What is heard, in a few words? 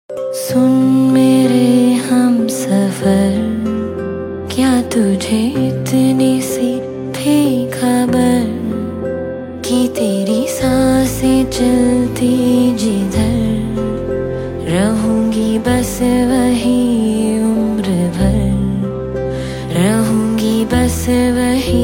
Female Version Love Song Ringtone